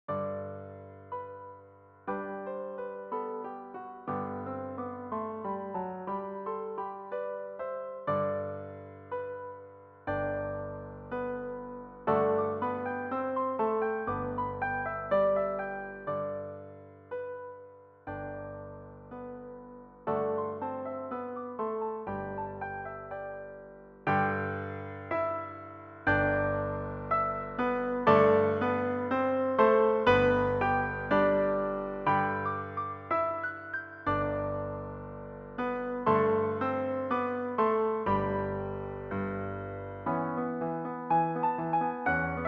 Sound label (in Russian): Колыбельная для 2-х фортепиано в 8 рук; И.Филипп; Транскрипция А.Иванько